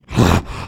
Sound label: Jump